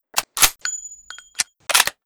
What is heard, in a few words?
reload; hl2dm